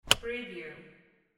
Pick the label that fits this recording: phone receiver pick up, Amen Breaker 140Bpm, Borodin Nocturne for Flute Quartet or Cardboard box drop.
phone receiver pick up